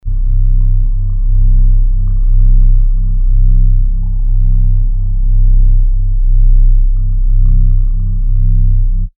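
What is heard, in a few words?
drone low frequency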